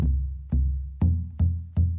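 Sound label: Calm Far, music; From Dust; ps3; xbox 360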